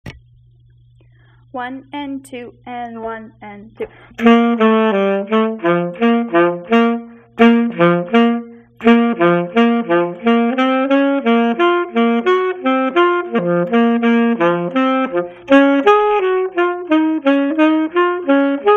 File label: duets duet i part 1